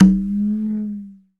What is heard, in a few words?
conga muff; STEREO CONGA; Cuba